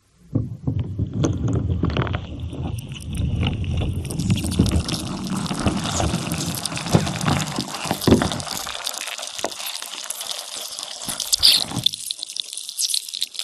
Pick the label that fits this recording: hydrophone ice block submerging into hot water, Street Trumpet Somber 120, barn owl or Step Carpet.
hydrophone ice block submerging into hot water